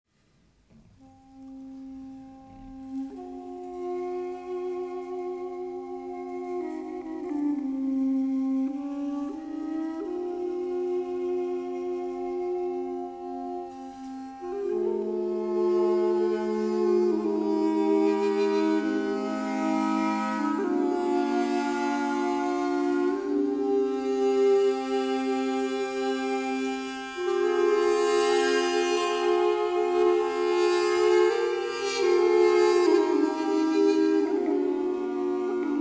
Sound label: Duduki Trio